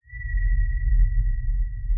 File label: sonar Ping Suit Far Beacon; Barotrauma; Real Sonar